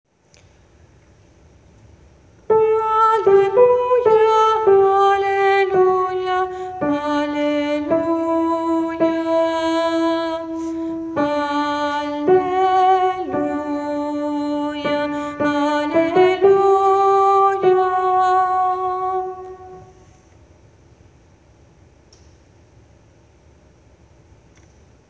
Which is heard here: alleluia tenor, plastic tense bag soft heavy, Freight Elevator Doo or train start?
alleluia tenor